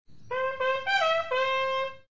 trumpet

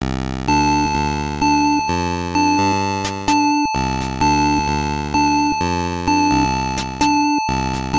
title screen

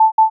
warning

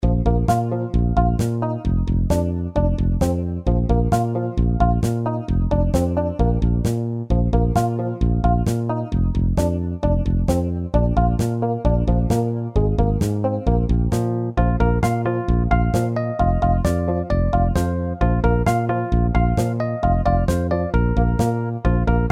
Lobby BGM

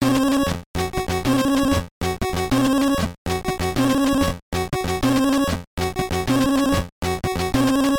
boss theme; simpsons

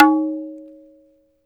tabla na o

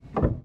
piano

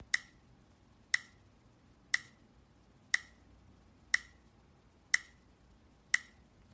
timer; timer-new

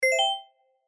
subscription, notification sounds

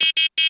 drop gold

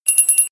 notify

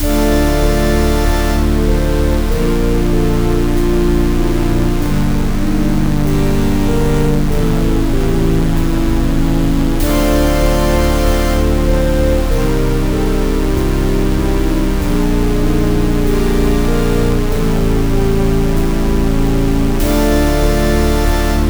castle adventure

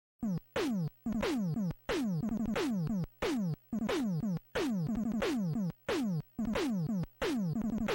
weapon get; mega-man

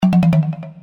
fail

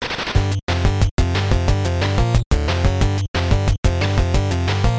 refueling; top-gun

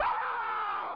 death scream; doom

